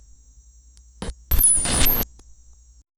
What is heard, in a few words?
a small detail was put, everyday items